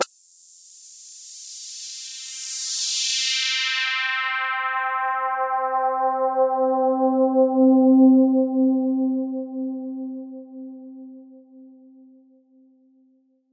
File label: floaty hipass chorus note; Pads